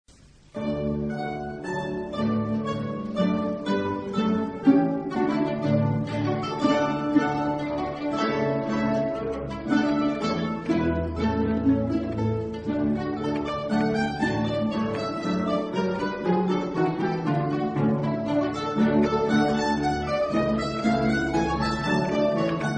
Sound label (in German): orchesterquartett